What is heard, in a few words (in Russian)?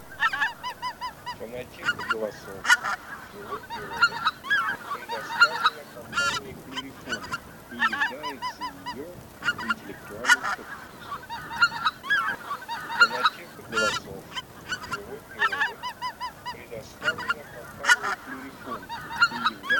Белолобый базар Oche Lombardelle; plurifon